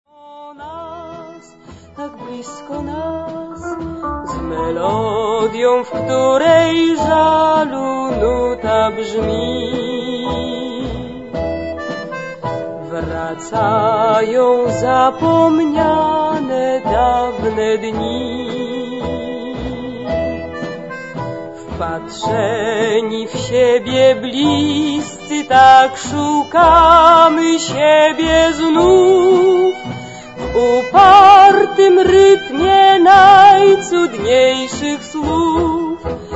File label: tango bolero